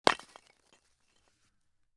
Hit Cement With Sledgehammer, Hitting Cement With Sledgehammer; Some Shard Falloff; Objects